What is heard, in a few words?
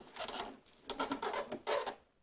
chair creak